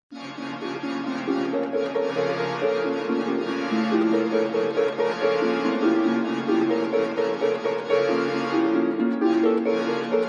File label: organ and harp